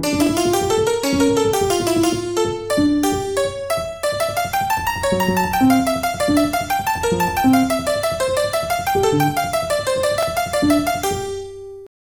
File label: clavichord